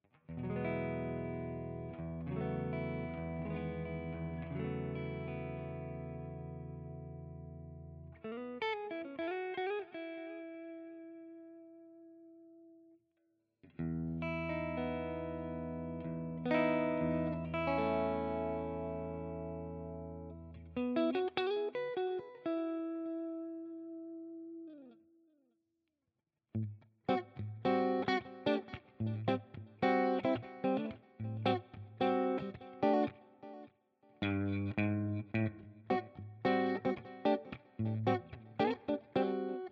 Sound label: Line 6 Catalyst Clean DI Out